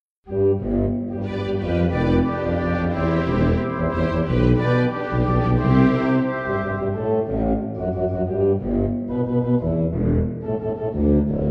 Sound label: Magnificat Instrumental; Bach